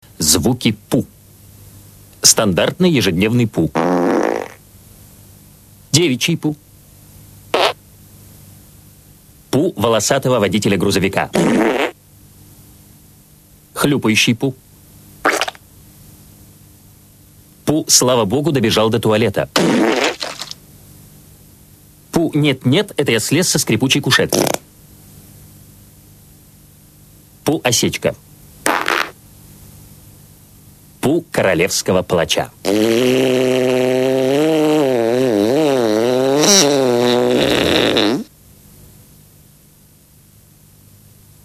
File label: fart sound